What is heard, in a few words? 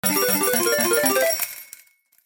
win rare; slots; gangsterallstar